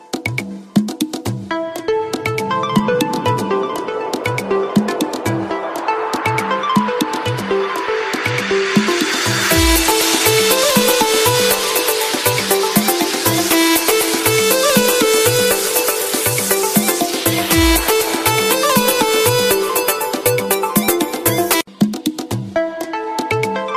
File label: latin remix new ringtone